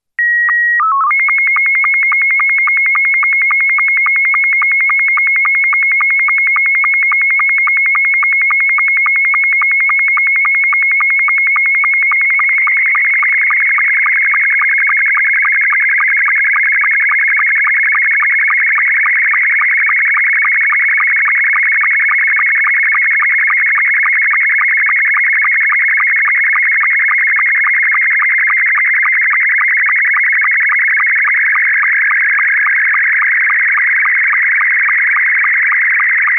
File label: sstv image scottie2; essexham